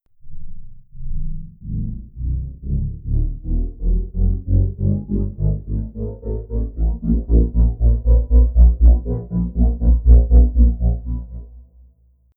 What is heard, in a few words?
molluscoid colossus wind up; apocalypse